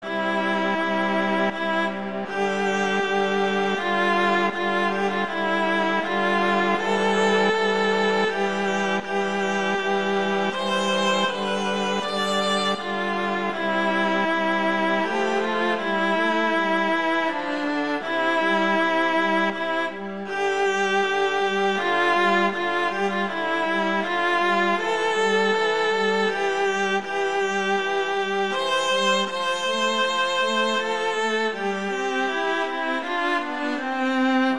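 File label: Duet Andante, violin viola; Mazas; Duets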